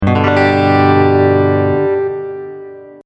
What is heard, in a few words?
Clean Chorused Strat Fretboard Voicing; chorducopia